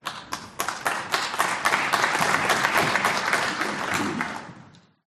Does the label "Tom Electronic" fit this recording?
No